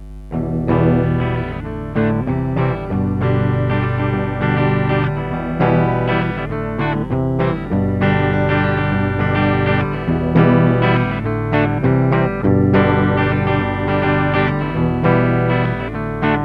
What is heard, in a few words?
clean